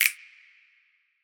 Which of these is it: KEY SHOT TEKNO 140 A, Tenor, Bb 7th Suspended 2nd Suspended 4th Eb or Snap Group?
Snap Group